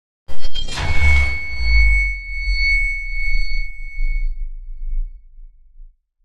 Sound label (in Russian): mech klinok